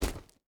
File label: footstep rubber boots gravel jump land; Rust